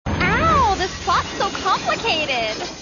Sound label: owww